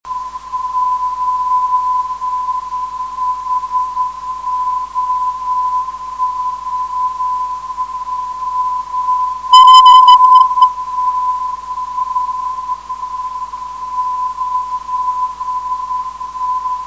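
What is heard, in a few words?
358 kHz, non-directional beacon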